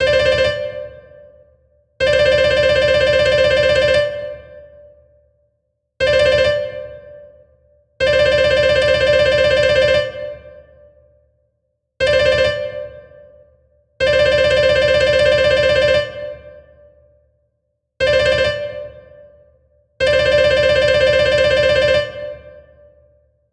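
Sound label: trill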